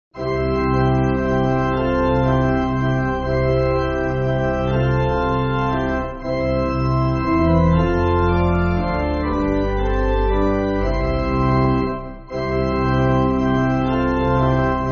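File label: Pipe Organ, Free Music Backing Files for Churches; Phillips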